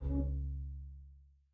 lower oneshot hoover

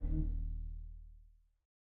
lower oneshot hoover

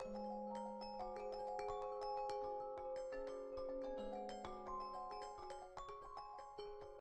loop mallet

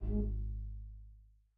lower oneshot hoover d

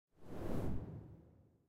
swoosh zoom in; oneshot

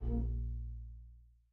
lower oneshot hoover e

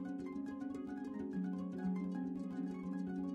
guitar harp; lower loop

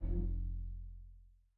lower oneshot hoover c

